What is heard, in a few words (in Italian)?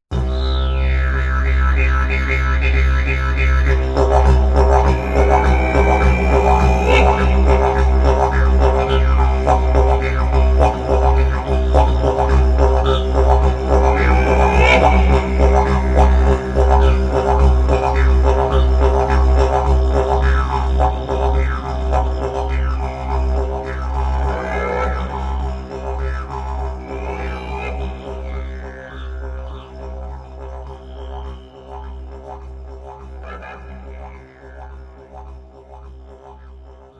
didgeridoo, suono didgeridoo